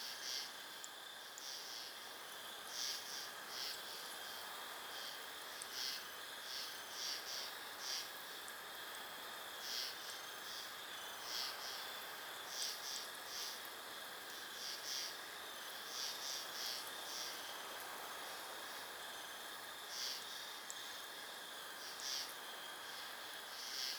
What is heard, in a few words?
ambience coniferous forest night calm dry; Rust